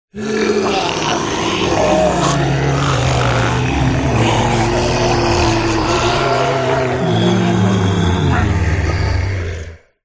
horror zombies x15 groan

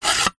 household knife sharpen in sharpener

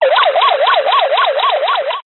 science fiction radio signal interference burst

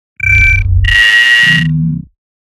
Hologram

